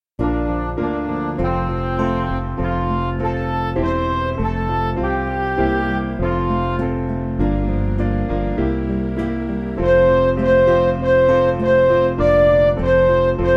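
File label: Music Backing Files for Churches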